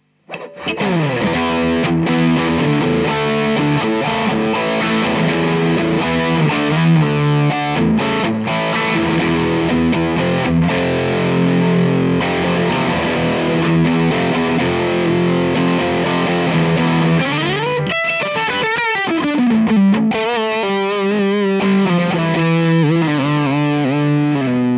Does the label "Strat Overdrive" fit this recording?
Yes